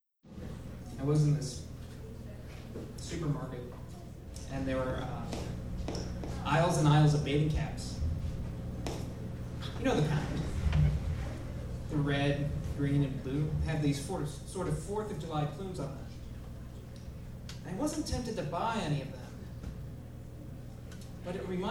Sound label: Tuning